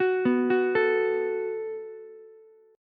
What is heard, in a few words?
Gb Minor Double Flat 5th, Ukulele; Gbmbb5 arpeggiated; 11,11,14,12